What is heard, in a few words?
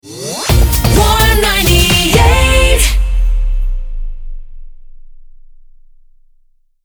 Hot 128bpm D Rapid Fire, jingle; Warm 98